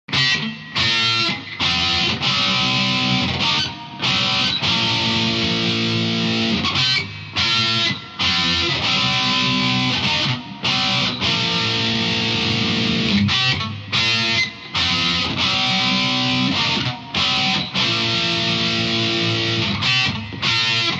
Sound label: tubeman